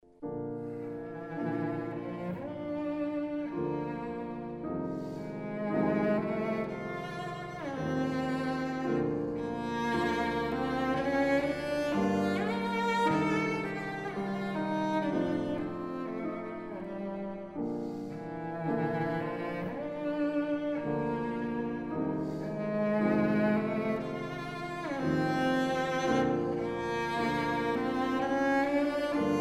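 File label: piano trio; boellmann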